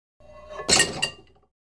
Free Weights Exerci

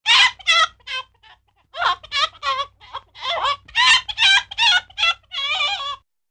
Funny Sgl Chicken, chickens

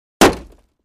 Plastic Car Door Hit

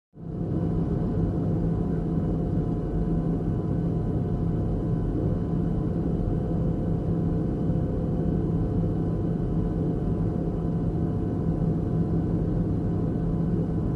Interior Boeing 727 In Flight And Landing.

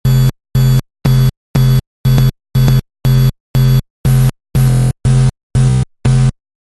Digital Pulse Alarm, stinger

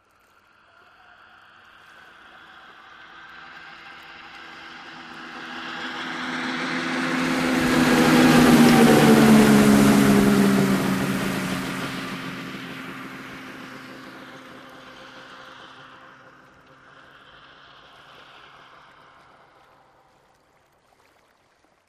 Jet Ski; In, By, And Away Slow With Cu Water Laps

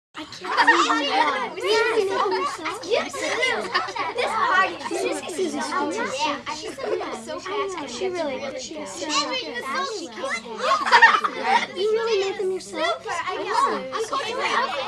Girls Party 12 Yr Olds, cheer

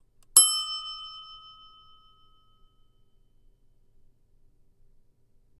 Bell Egg Timer

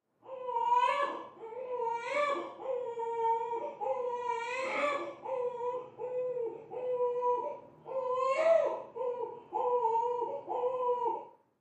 Baby; Crying, Newborn, From Next Room.

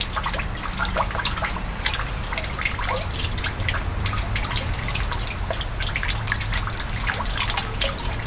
Water Run Sewer

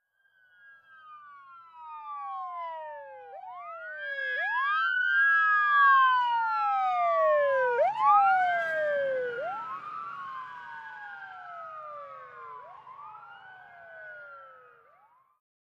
Ambulance By Medium, ambulance

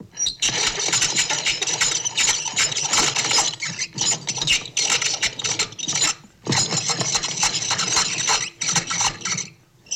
Pulley Squeaky Move

